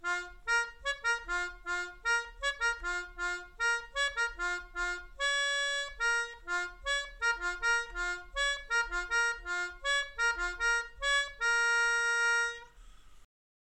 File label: Reveille & Assembly, Melodica